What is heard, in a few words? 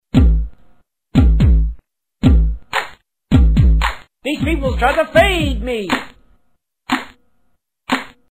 cz ocassional beat; Strong Bad and Coach Z